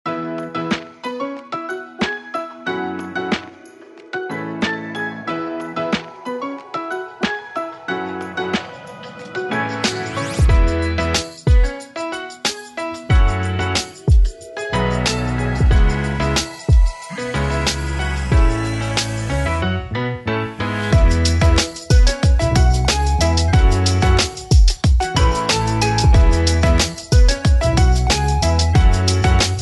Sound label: urban